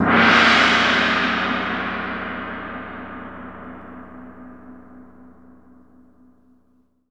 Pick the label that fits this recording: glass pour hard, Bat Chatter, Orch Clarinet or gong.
gong